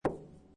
Billiard Pool Snooker Billiard Ball Hits Side Of Table